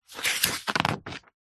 household book thick heavy encyclopedia modern glossy page turn; zapsplat